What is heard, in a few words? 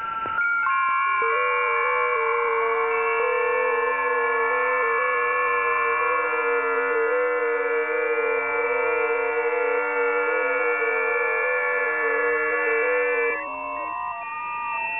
websdr test, ft8